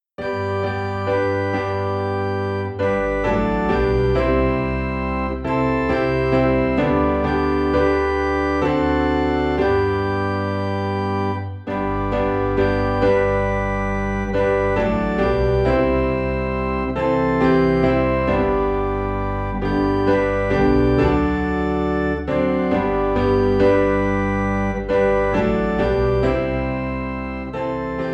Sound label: Piano and Organ in G; Higher Ground